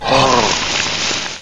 hurt; sven; aomdc; monsters

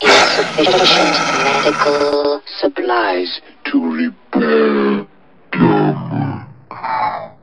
gonome death; gonome hev

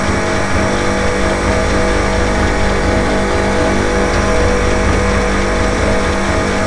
ambience; caged

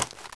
battery pickup; items

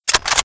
m16 mag out; weapons

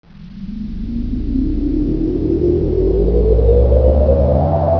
power online; items

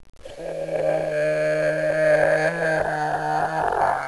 hc die; aomclassic; monsters; headcrab

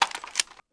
flare pickup; items

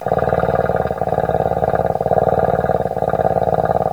purr; caged

